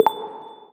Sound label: Gravity, notifications; Amazon Fire Tablet 5 Gen